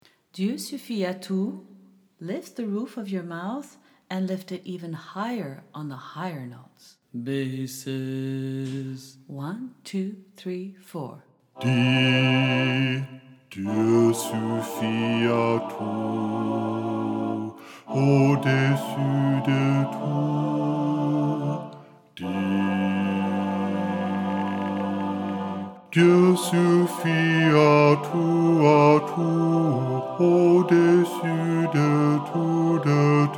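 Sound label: bass, SATB, French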